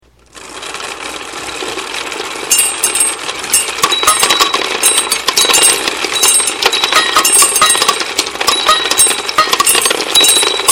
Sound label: machine; marbles